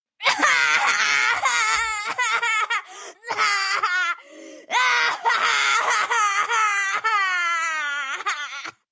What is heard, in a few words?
Crying Forced, Crying, 1 boy, loud, rough, overdone..fake, yelling, human voice, kid, child; male; VoiceBox